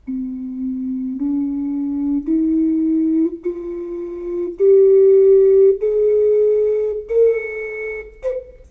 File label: okarina